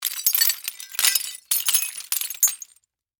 Crash Glass Debris, Impact, Crash, Glass, Debris, Drop; Crashes (Sound Effects:Objects); BlastWave